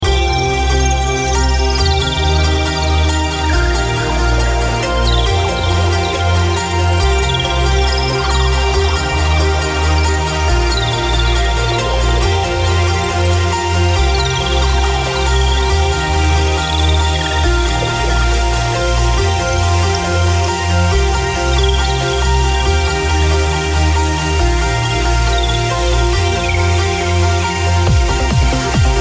trance uplift

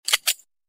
1911 slide

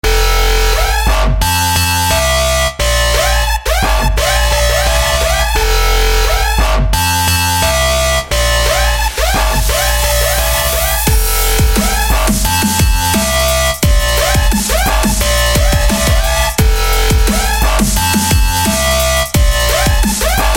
EDM Tone

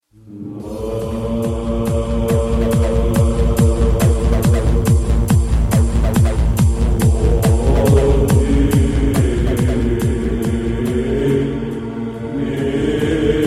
Greek Orthodox, ringtone

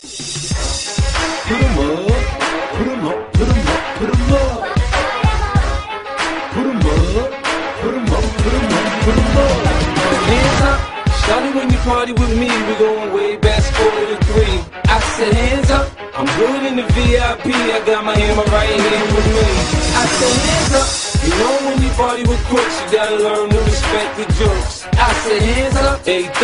black beat, hip hop

